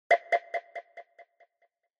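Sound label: Onomatopoeia Sigh High Slow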